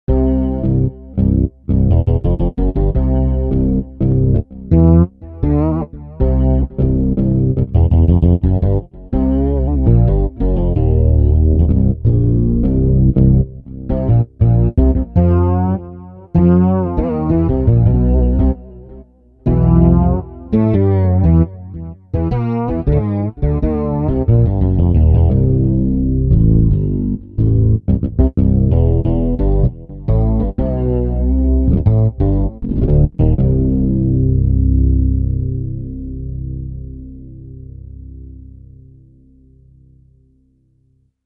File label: Oct Fls, Roland SRX-07 Ultimate Keys